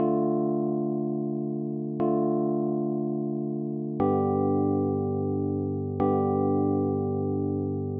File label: Chord